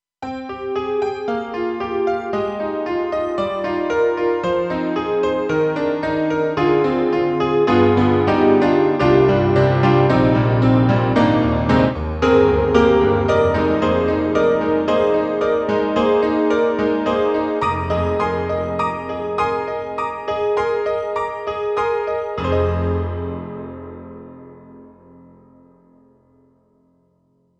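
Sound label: Honkey-Tonk, piano; KORG M3 XPanded Program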